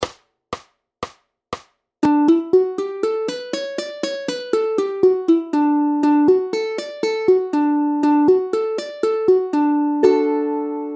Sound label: D major Ukulele Warm Up Exercise